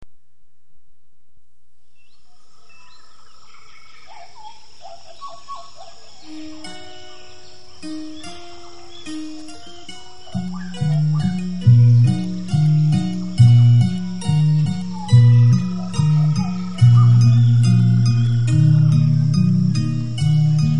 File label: harp